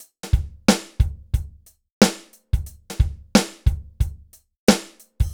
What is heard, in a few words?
BPM 90 DRY; AKAI S-Series CD-ROM Sound Library VOL-4